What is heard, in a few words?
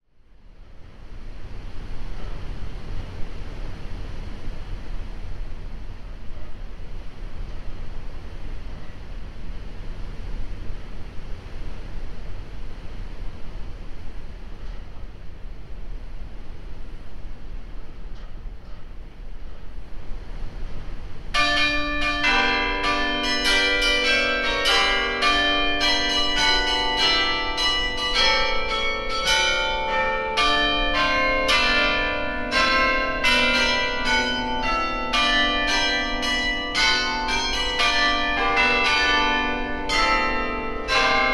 carillon